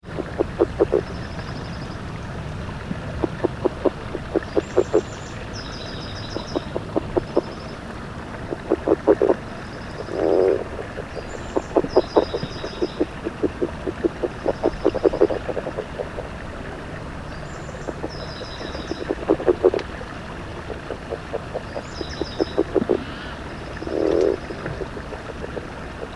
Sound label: rdraytonii long